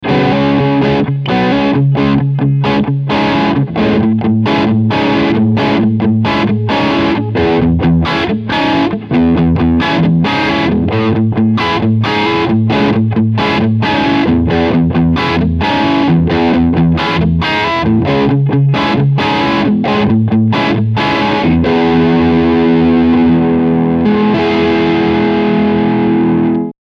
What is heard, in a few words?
Humbucker Crunch